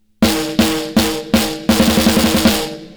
SNARE CLAP BOOSTER; Gainstation

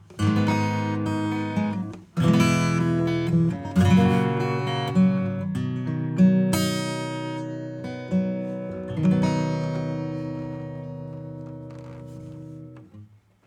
acoustic; Analog Pro; Analog Presence

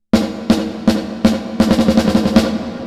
Reverb Compare Snare

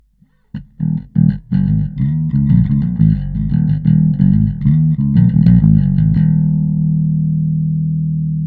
160 Q5.0 solo; BASSROOM

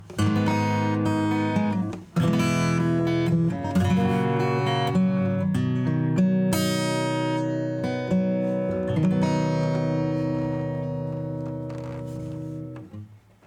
Xtressor acoustic

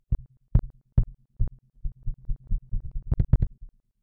bassdrum; VolumeShaper 6

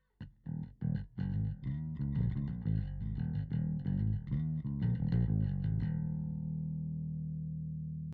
Compressor bass Little Bass Grit

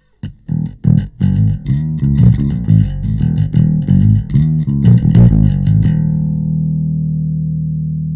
bass Bass Bubbles; Nanopulse

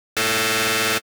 Wavetable Saw PHASE MOD Self; Pigments

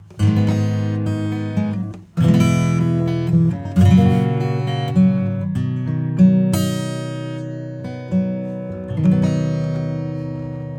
acoustic; TARGETS ENGAGED

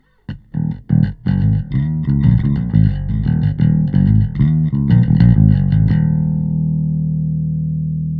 bypass Bass

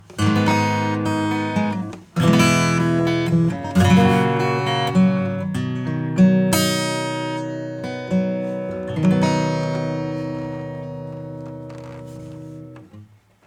acoustic guitar; MB Ac Guitar

